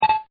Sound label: BEEP DOUB, Beeps; Objects